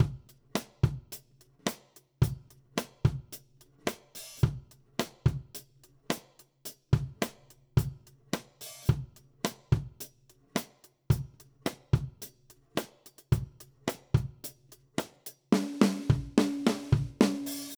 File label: Country Drums Verse; Verse 1; Digidesign; Pro Tools Essential Loops